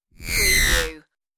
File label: alien power charge